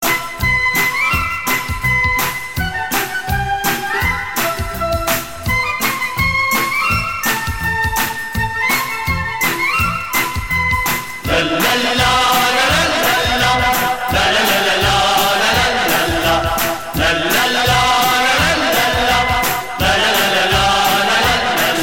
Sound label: flute